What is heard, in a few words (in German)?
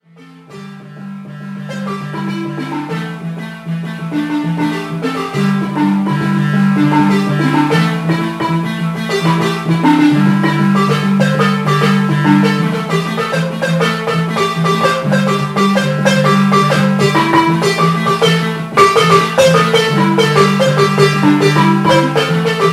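Balafon vierhändig Soundprobe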